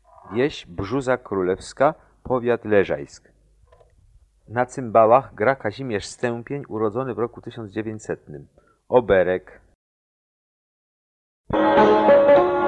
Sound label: Oberek